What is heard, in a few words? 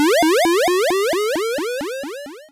wipe; scrapeboard